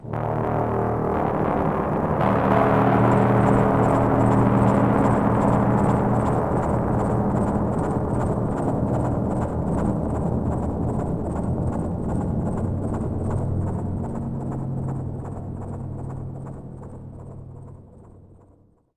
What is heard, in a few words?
Scary Amb Stinger